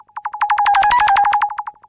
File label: computer cowbell; electro fx; Atomhard